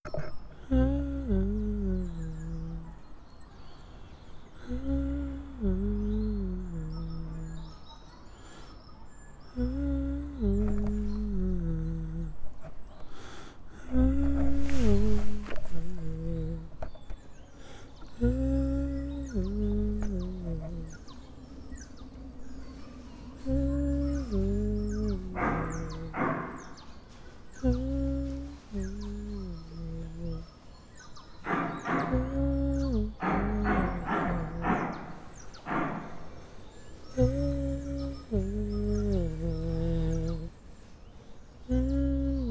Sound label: singing